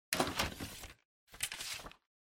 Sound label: Printer Cassette Tray Open